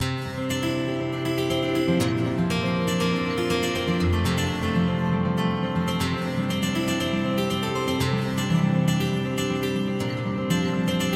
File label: Hall reverb